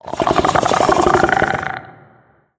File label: idle croak, barnacle; Half Life Alyx